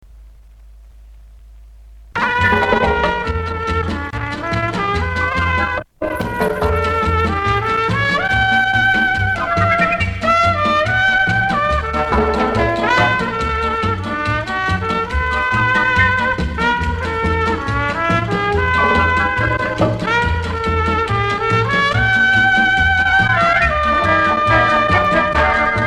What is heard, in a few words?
Instrumental